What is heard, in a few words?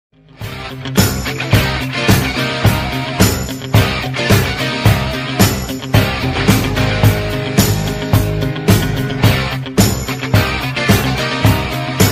Ringtone; Rocky